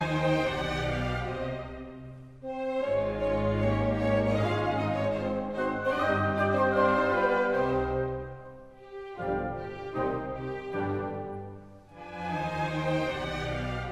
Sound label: piano concerto c moll allegretto